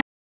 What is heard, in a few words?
Tap